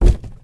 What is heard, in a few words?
cardboard, footsteps, walk; player; source engine; left 4 dead 2; infected